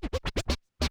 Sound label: Cuts, Vinyl Record Samples